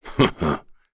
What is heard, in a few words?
Laugh; Bernheim; kings raid; en